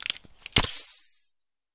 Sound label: stimulator use; Escape from Tarkov